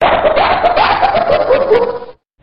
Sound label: multi laugh